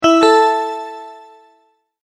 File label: multimedia tone verified